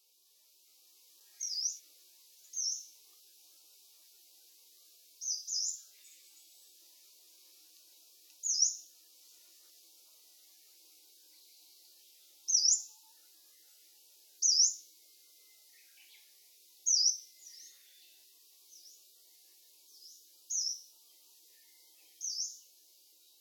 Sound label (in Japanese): karafutomushi; nagasaki